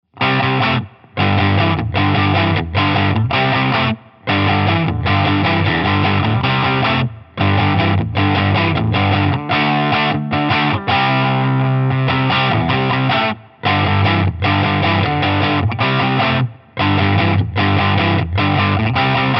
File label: semi, guitars